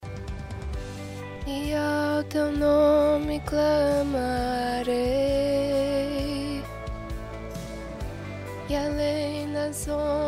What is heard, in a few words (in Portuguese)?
tenor